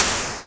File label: soft, explosions